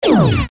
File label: Futuristic Gun Sound; FXHome; Bullet and Gun